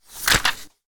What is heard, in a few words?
book flip